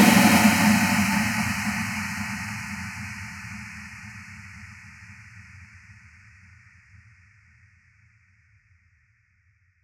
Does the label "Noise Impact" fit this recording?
Yes